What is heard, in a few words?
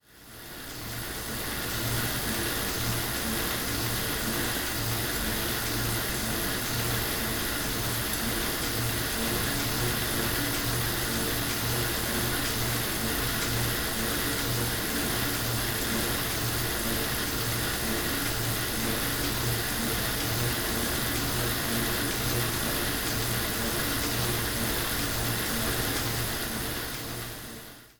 Excercise Bike Front